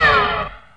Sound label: wep blaster fired